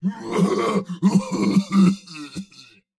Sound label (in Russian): Смех, переходящий в кашель, Фраза; Турбосвин